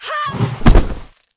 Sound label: Mob die; Bossmob; 욱일승천류; 히야미